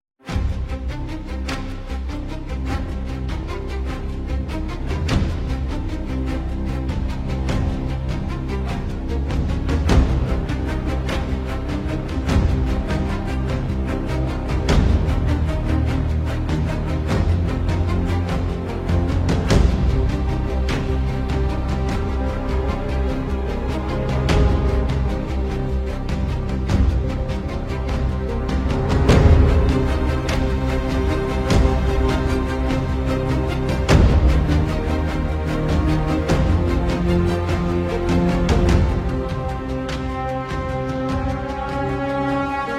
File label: Battle Waltz; Tactical Strike Group